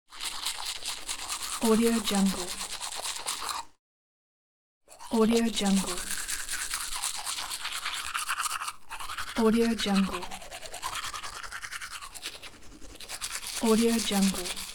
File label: brushing teeth